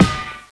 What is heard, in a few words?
hurt, paintball